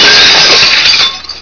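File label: glass crash; 999wavs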